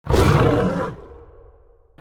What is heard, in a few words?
manticore growl; smite